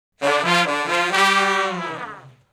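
Shuffle Blues Riff (G) unison falloff, Horncraft for R&B; Sections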